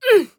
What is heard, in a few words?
Grunt Physical; Mage; Wandering Alchemist; Realm Royale